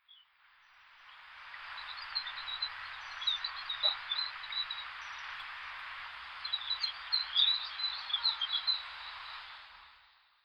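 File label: Verditer Flycatcher song; yonaguni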